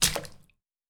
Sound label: Puddle